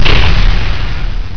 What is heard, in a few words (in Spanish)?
gran portazo; Atomix VirtualDJ 8 Pro